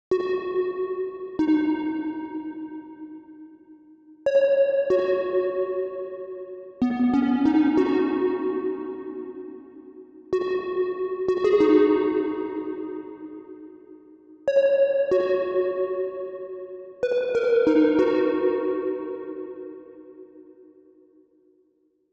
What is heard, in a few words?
94 BPM G# Min Pluck; Melodies; Loop Stems; Cymatics; GHOST (Beta Pack); Freedom